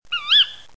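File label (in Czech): Strix aluco, samice „kú-vit“; Velikost: délka 37-43 cm, rozpětí 81-96 cm, hmotnost 280-720 g; Mapování rozšíření sov na východním Bítešsku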